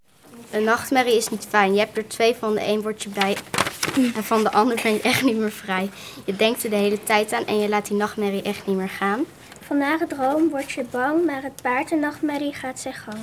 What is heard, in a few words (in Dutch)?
rap